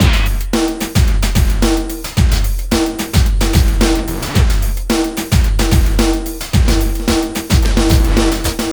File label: vinyl 110, Dance loops